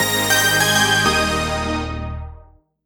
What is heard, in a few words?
Power Off, phonetones; LTE-A; SK Telecom